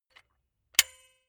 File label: silicone gun bullet lock mechanism heavy; berufe; handwerker; soundpool